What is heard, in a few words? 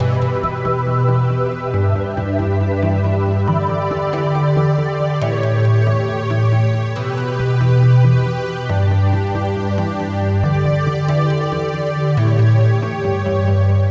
Pad, Synthesizers